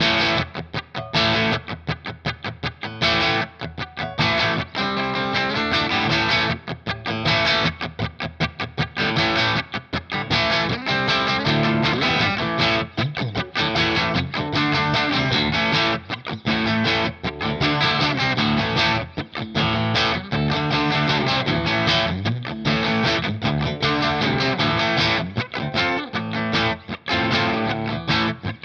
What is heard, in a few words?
Clean Coil Tap; Sterling By Music Man Richardson Signature